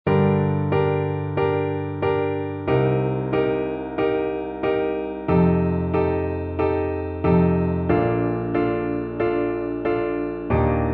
Ave Maria (Querfloete Solo + Klavier-Playback)

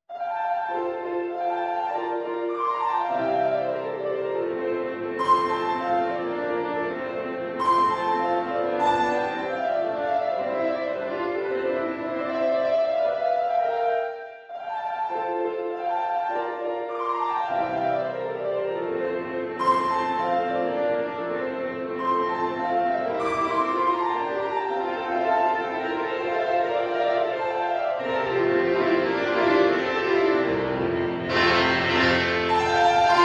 Sonatine

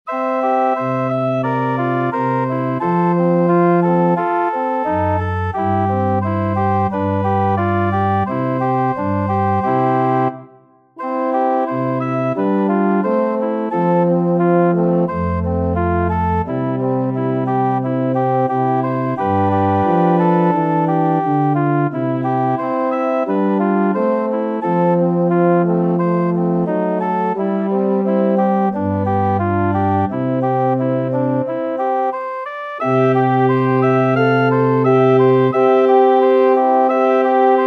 Naeher mein Gott zu dir, Horn Solo + Orgel-Playback

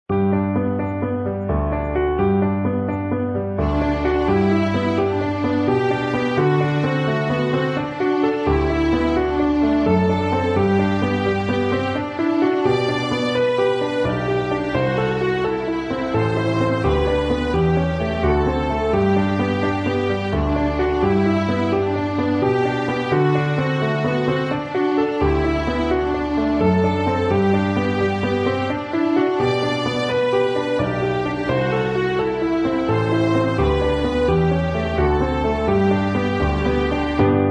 Happy Birthday, Klavier bewegt + Violine

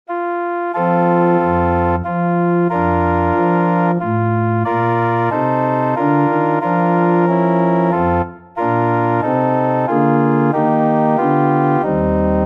Ich bete an die Macht der Liebe (Querfloete Solo + Orgel-Playback)

